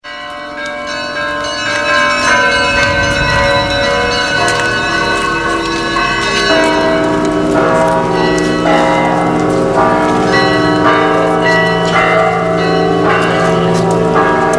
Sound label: bells